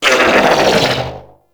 Scream; Warframe; Amalgam Override; Corpus

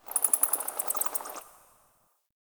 Critter Random; Warframe; Ambience; Nokko Colony

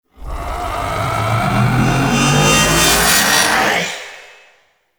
Backstab Sword Hover; Warframe; PaxDuviricus

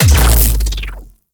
Capital Ship Laser Impact; Warframe; Corpus

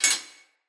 Split Sword Join, Swords; Weapons; Player; Starter

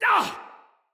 Pain, Soldier Male; Dendrit

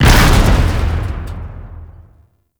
Street Barrel Explosion; Warframe; Ambience; Nineteen Ninety Nine

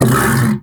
Undazoa Vocal Tranq Grunt; Warframe; Hunting Critters; Characters

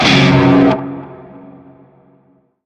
Shawzin Hirajoshi Chord; B; Warframe; Narmer Shawzin

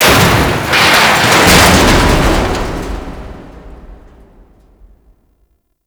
Infested Shuttlebay Ship Crash; Gameplay; Warframe; Corpus Infested

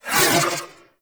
Proj Hip Whoosh; Weapons; Warframe; TheNewWar; Omicrus

